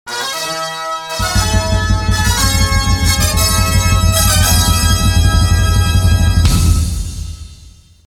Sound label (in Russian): fanfary; svadebnay